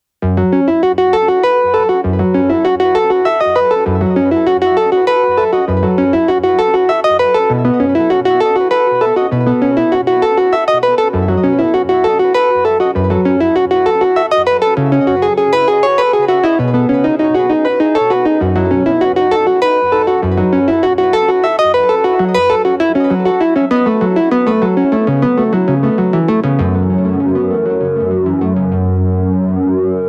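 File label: osc sync lead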